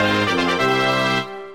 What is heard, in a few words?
level up; fire emblem